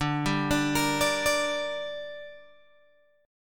D Suspended 4th Sharp 5th D down Guitar Open G, chord